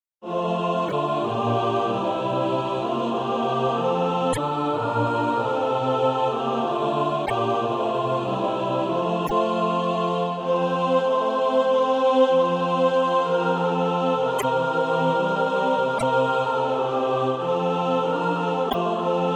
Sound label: mixed